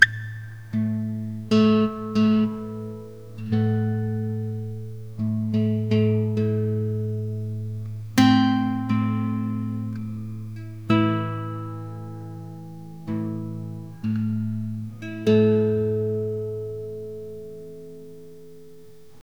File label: acoustic guitar string